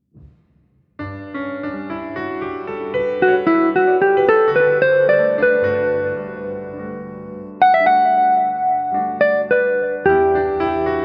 Jazz, Restaurant Music

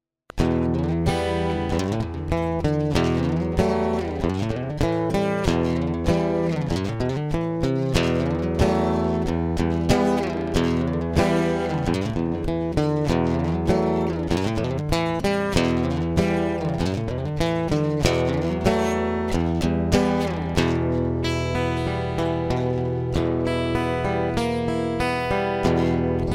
Lute; Mortal Shell

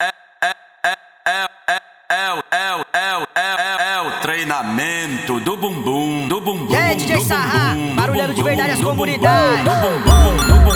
Funk Phonk Speed Up

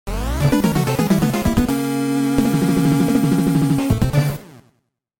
Game Over; Contra Force

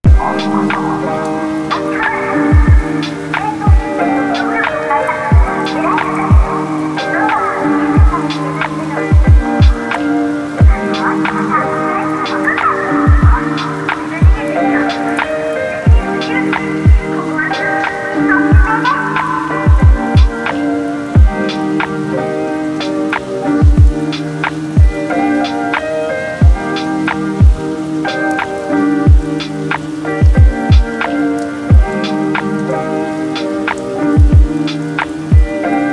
Lofi Chillhop Beats

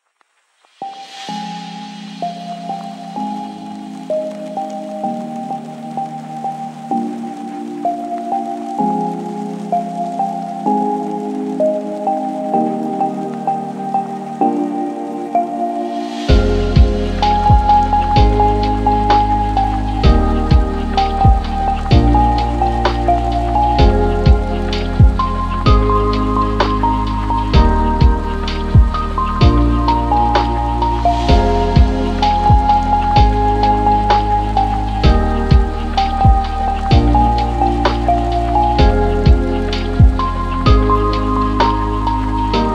lofi

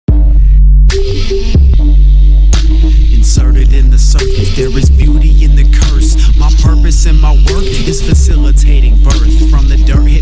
acapella

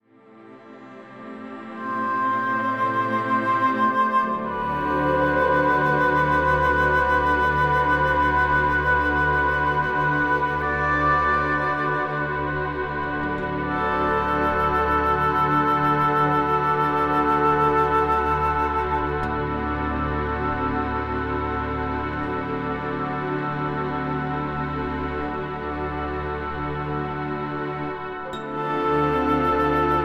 Sentimental Sleep Music Lullabies

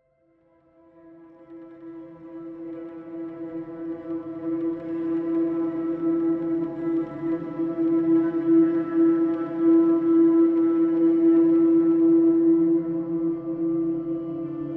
Meditation Rain Sounds

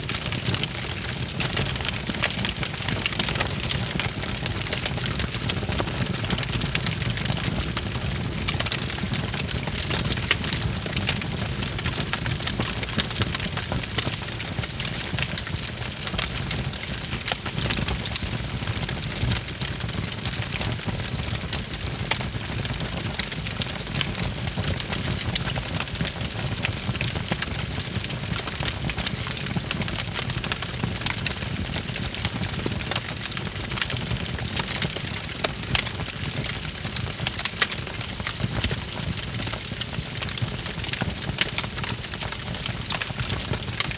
Medium Campfire

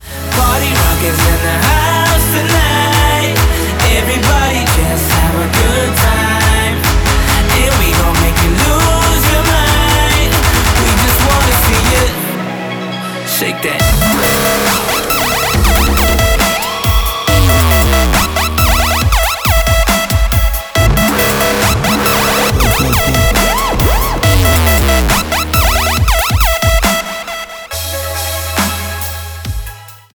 Dubstep Build Up

